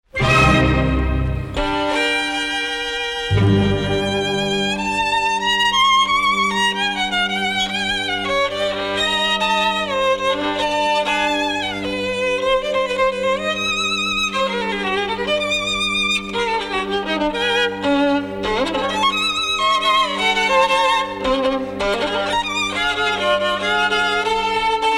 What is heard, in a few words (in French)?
Concerto pour violon et orchestre Praeludium; Nielsen; op. 33